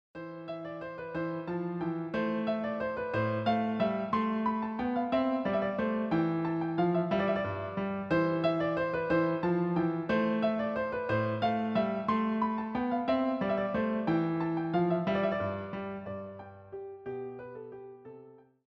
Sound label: Minuetto Fantasia in A minor; Telemann